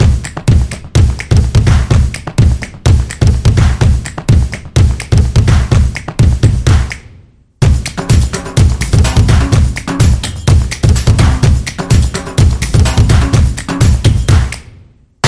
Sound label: Percussion Within; percussive nation vol 4